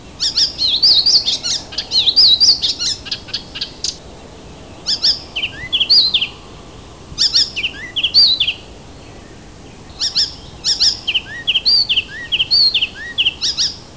Hippolais icterina

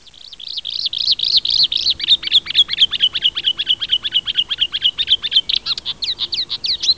Acrocephalus palustris

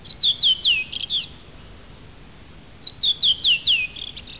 Turdus iliacus

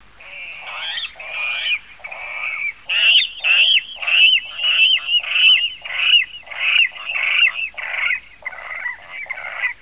Rallus aquaticus; Пастушок водяной

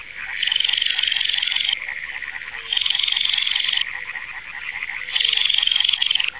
Porzana pusilla; Погоныш-крошка

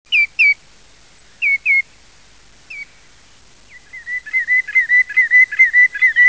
Tringa nebularia

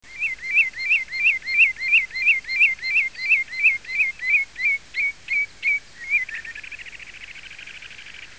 Tringa totanus

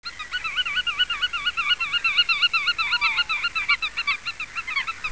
Limosa limosa

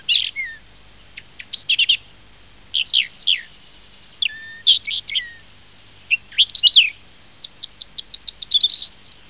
Saxicola rubetra

